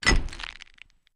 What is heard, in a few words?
Flash Bulb Pops Poofs